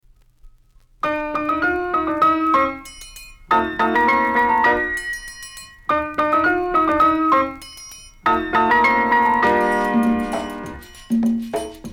latin american rhythm

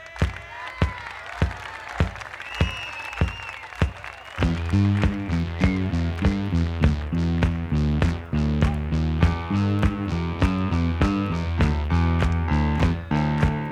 foot stompin music

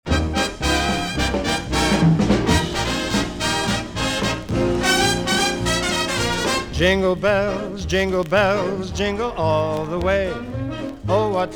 jingle bells; Джаз

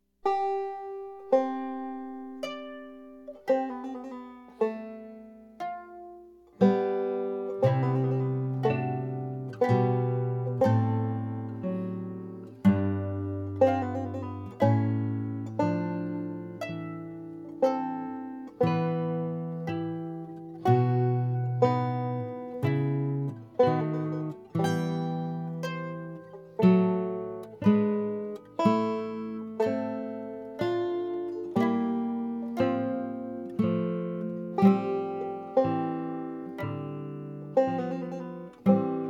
concerto in C major grave, banjo; G. P. Telemann

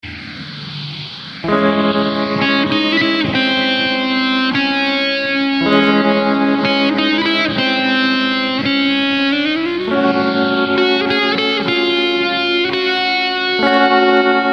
gitara

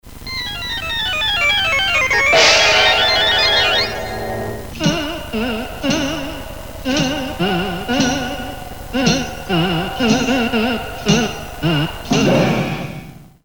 organ; Музыка из мультфильмов

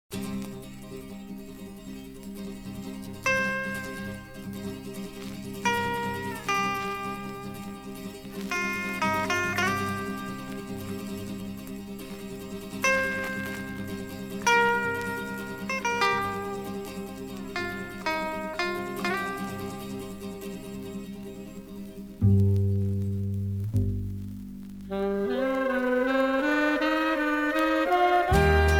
saxophone

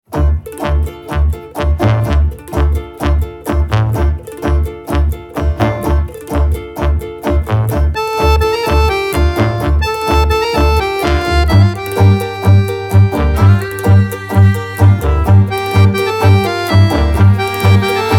tango

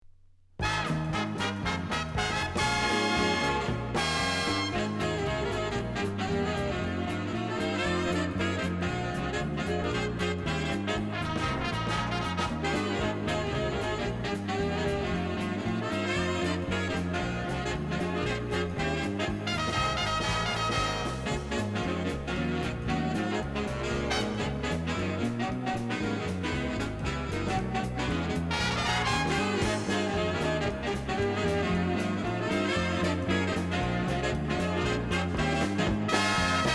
saxo sound; Austria; Jazz, Funk / Soul, Pop; Easy Listening, Soul-Jazz, Disco

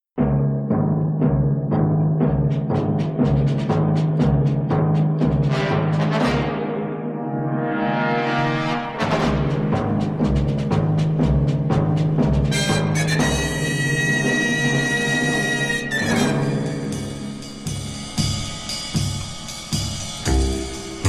strings with brass

